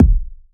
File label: warm kick drum sound D# key, Royality free steel kick drum tuned to the D# note